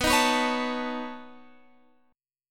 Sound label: B 9th B down Guitar Open F; strummed